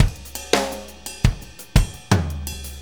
Drum Tools, Loops

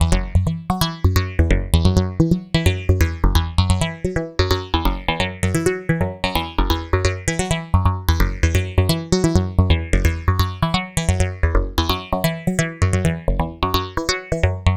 Arpeggiation, EDM; Sonic Foundry (Sony Creative Software); Afterhours EDM

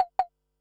Perc, Percussion and FX; Pearl SC-40

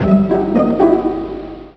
Orchestral Plucka; Zero-G Jungle Warfare 1, 2 & 3; Strings & Things

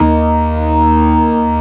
STOPS, ORGAN; Keith Emerson Signature Series

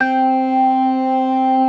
CLASSIC C3, Synth and Organ

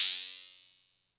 Percs, Elektron MachineDrum; SPS1 MKII

